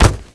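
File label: bullet hit kevlar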